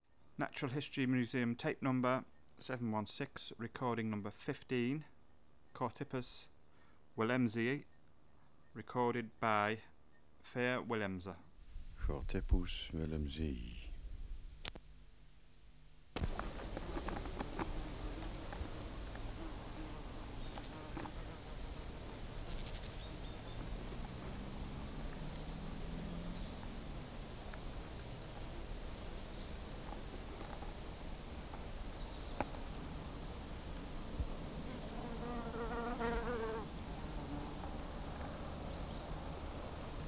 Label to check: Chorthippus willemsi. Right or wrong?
Right